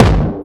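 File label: bass drum, right; Zero-G - Chemical Beats; BASSDRUM ZOO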